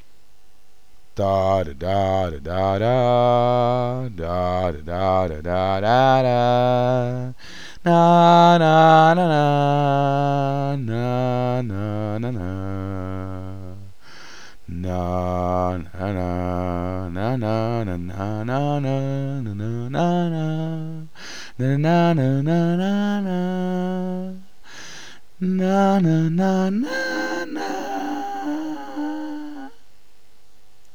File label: hymn